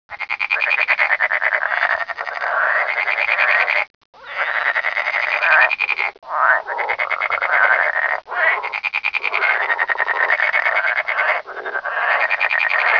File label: frogs